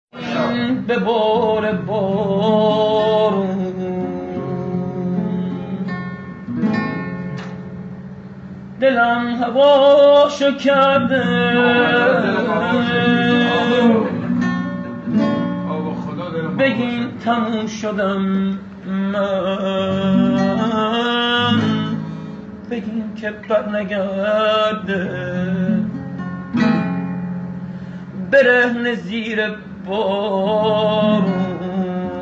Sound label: Guitar